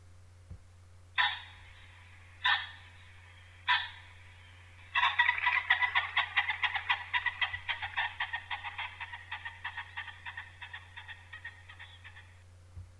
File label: Sulun, himalaya monal